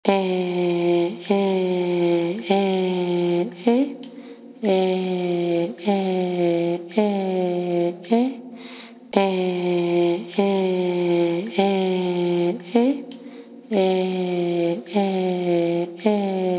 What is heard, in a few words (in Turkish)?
Ninni Anne Sesi